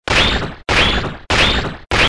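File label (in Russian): робот ходьба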